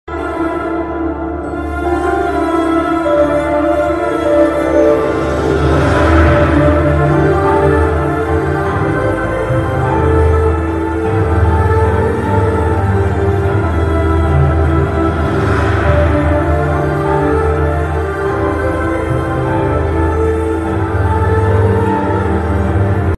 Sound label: sad bgm emotional bgm; ooru peru bhairavakona movie